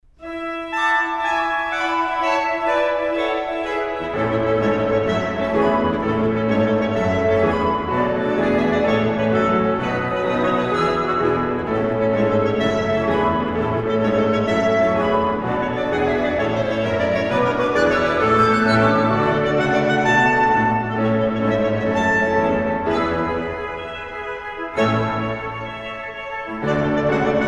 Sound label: Festival Fanfare